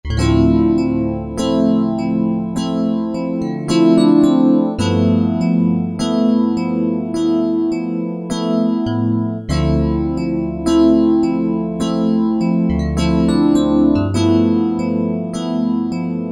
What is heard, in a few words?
Beauty Rhodes; Piano; Roland SR-JV80-03 Piano